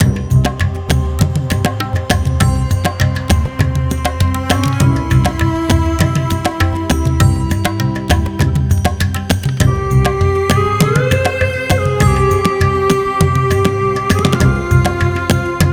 bonus Prize background Music; Temple of Iris 2

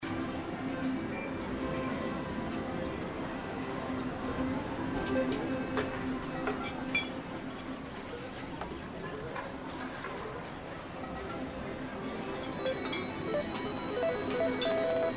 bg new with clinks; My Bingo Hall

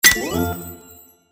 shot hit; Fluffy Arcade: Doubleup Ducks LuckyTap; Eyecon

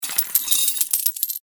coins end; Step Back 7's

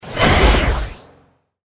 trident rotate; Champions of Poseidon

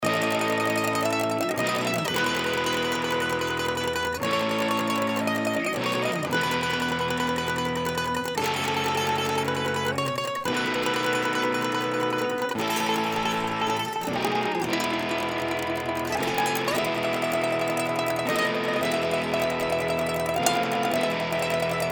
general Music mandolin drive Guitar; Lucha Rumble

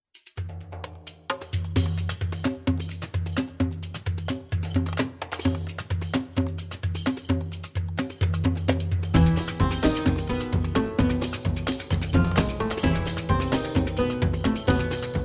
fs music; Eternal Wishes LuckyTap

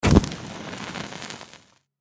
torch Light; tiki-beats; eyecon

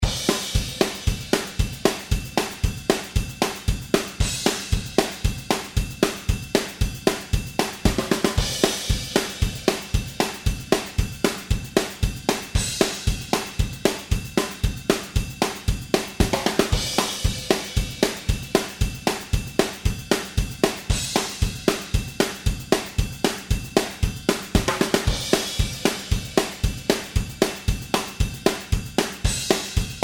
Music drums Fast; Lucha Rumble

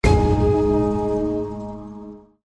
special Symbol land; Shamans Dream 2

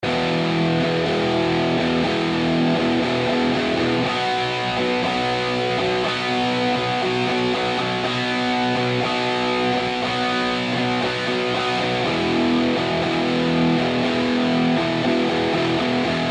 heavy guitar; Lucha Rumble

